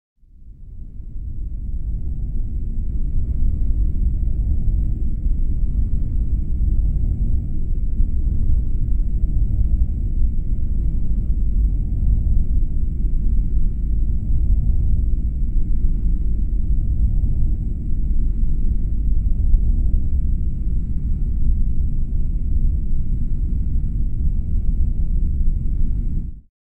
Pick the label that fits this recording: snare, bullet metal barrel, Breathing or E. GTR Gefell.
Breathing